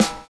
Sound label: N.Y FILL; Univers Sons